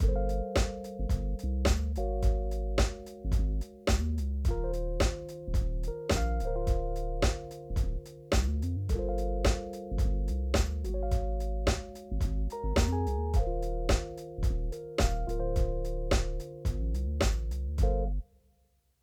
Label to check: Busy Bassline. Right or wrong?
Right